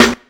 good rap snare sound a sharp key, acoustic snare sample tuned to the A# note; snare drum samples